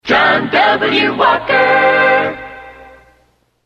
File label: Acapella; WAKY